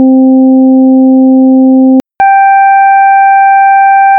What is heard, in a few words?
twelfth